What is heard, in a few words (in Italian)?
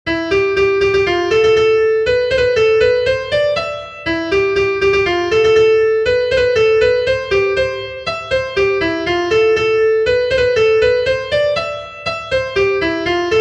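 Melodia popolare americana, pianoforte; ppoplare americana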